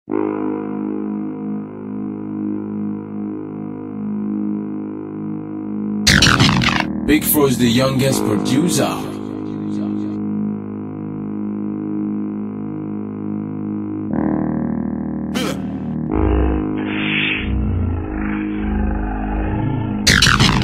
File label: Instagram Instrumental